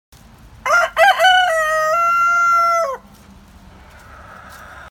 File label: Chicken Crow